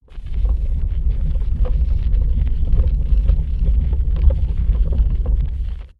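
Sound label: Stone Roll, Der rollende Stein bewegt sich über ein Pflaster aus Steinen. Der Sound stellt ein dumpfes, hallendes Rollgeräusch des bewegenden Steines dar; Um die Macht des Steines zu zeigen, ist der Sound so dunkel wie möglich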